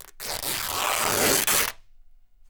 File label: Canvas Bag Rips